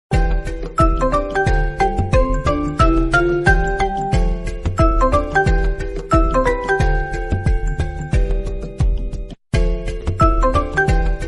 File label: asus, نغمة رنين هاتف اسوس Asus; ringtone